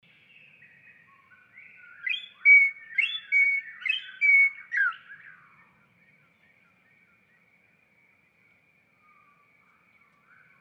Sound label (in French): chant; Chant 3 bis moins 1 octave